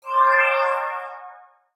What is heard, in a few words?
door; Shin Megami Tensei IV Apocalypse; 3DS